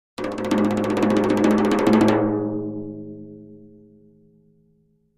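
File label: Drums Toms B Roll Crescendo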